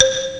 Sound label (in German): Geschirr; ST-XX Amiga Soundtracker Sample Packs